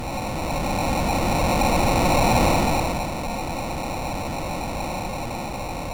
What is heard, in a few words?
Moon Hop, sid, MOS6581R3